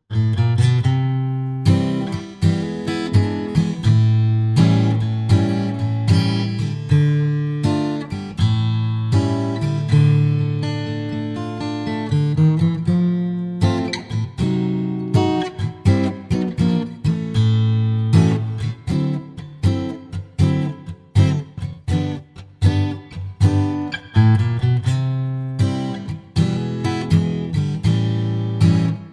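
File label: Acoustic Guitar; SM Pro Audio – Tube Box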